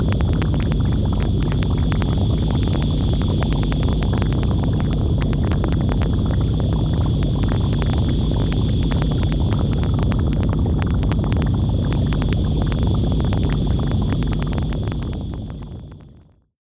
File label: toxic ambience; Space Captain Yanya - Original Soundtrack